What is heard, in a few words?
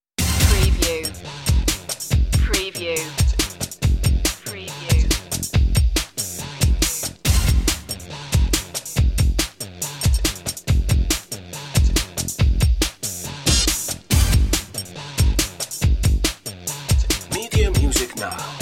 workout